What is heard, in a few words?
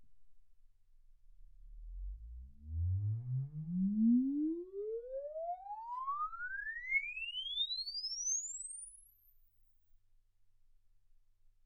exp swept sinus 10Hz 24kHz